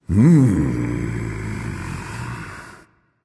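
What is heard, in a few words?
nonverbal content; smite; voicepack; ullr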